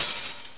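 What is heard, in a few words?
Open Hi-Hat, drums; GMPlay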